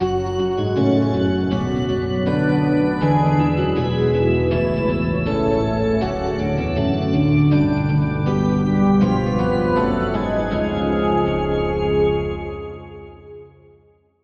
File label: Key - Falling Echo; Routed Sound; Vital Expansion - Street Vanquisher Vol.1